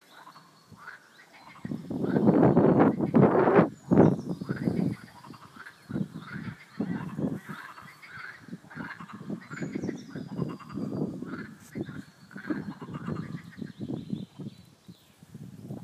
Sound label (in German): Kröten